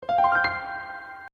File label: Piano